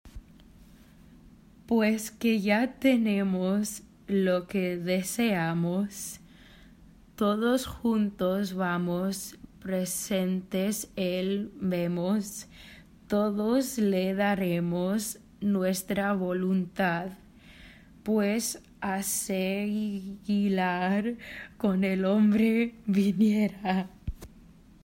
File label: RIU RIU CHIU SOLO Verse